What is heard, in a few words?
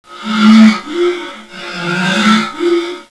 heal teleport; zombi; cstrike